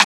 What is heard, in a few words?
Rim